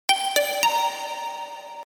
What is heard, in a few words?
Message smartphones, notifications; S300; Nobby